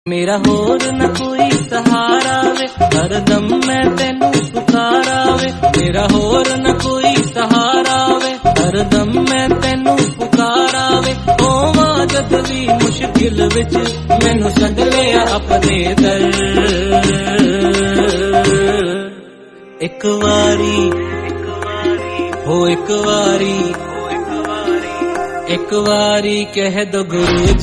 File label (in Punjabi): punjabi, sad